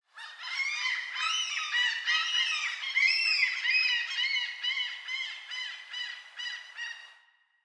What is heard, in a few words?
ambient sea birds